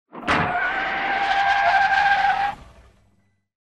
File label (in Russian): zvuk lopnula shina i mashina ostanavlivaetsja